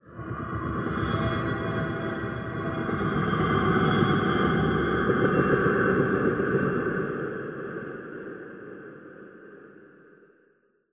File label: amb prepper rhythmic, ambient; Half Life Model Pack